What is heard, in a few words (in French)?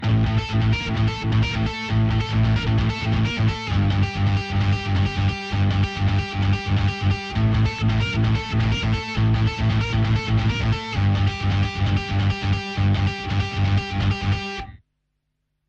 Basse; Assassin